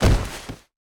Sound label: ground bounce; bloom minigame